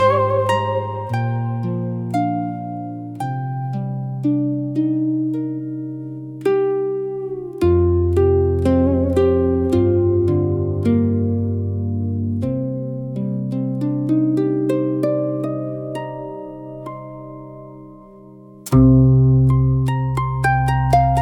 Snow Between Footsteps, koto afterglow melody for elegance; delicacy japanese beauty tranquility